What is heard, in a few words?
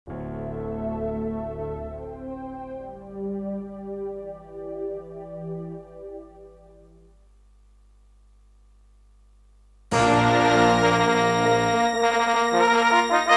awe32; xwing